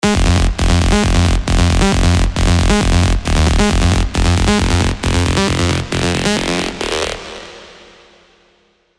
Spire trance lead; Carte Blanche

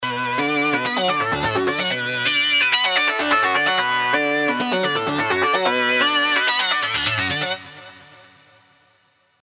Synth Loop B 128 BPM; Sylenth1; Tron

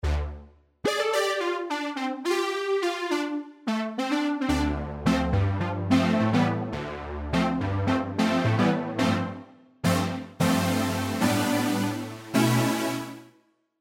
Serum; Split

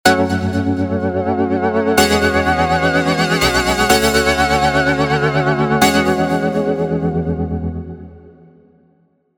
Wobble Kords; serum; GOLD

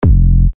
808 Buzz; spire trance leads

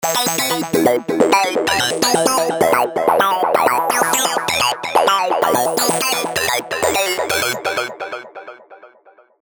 Acid Loop E (128 BPM); Sylenth1

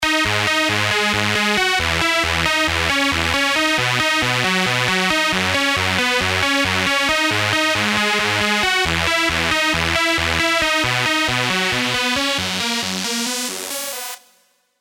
SERUM SUPERSAWS

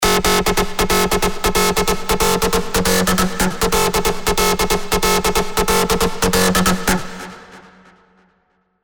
Acid Loop F# 138 BPM; Spire; Solar Bashkiria; ACIDVSLASERS1

